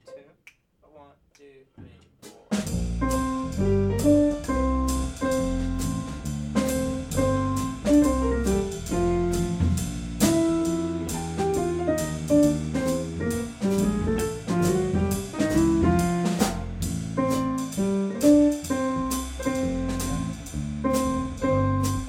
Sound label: jazz